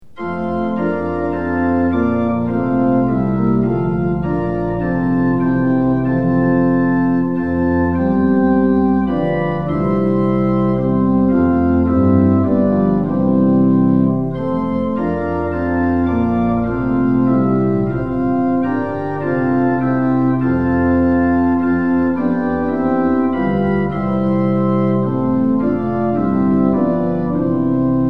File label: tune; hymns; lillenas